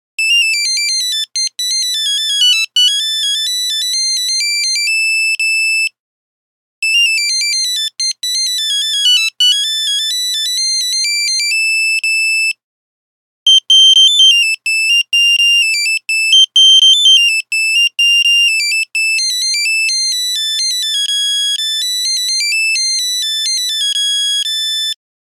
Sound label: Nokia Buzzer Polska; Windows Phone apps